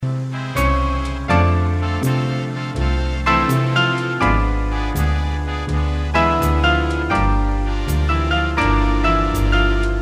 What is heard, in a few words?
piano